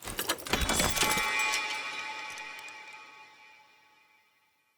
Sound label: Blacksmith Table Helmet Set Down Ring Out; Blacksmithing; Friendly; Tuvalkane